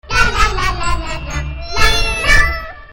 cute meow; animals

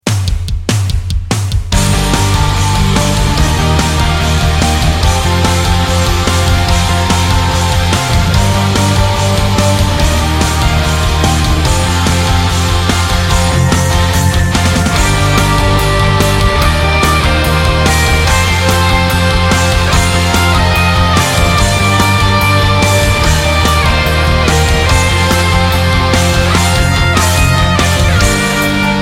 Sleepless Beat

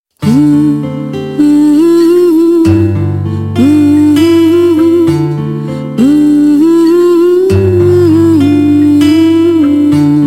nice female; classical

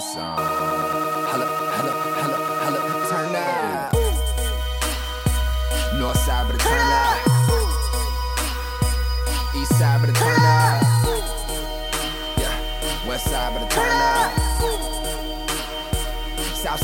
Hip Hop

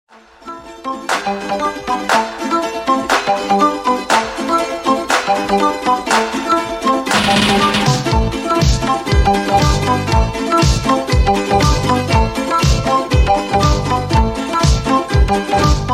balalayka

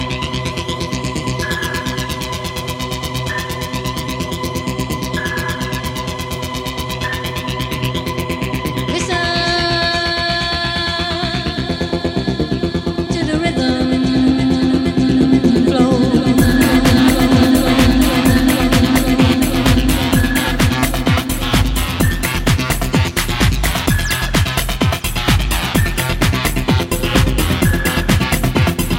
Rhythm Flow

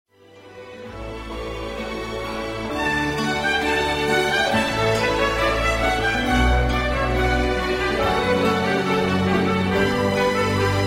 canon in d; pachelbel

